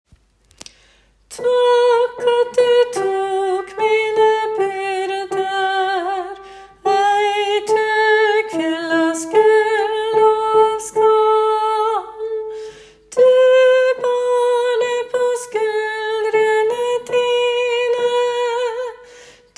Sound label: tenor; åfjord